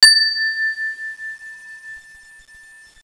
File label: chime